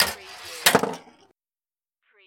Pinball sound, ball drops on rail, rolls and falls off end